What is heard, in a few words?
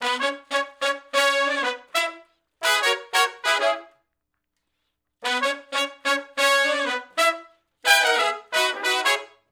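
R&B Riff (C#min) unison to harmony; Sections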